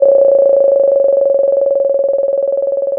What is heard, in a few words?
pop, Sound of a phone ringing